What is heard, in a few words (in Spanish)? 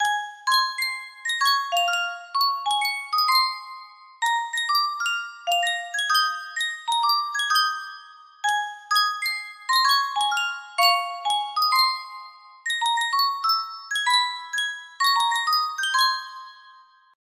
Yunsheng caja de musica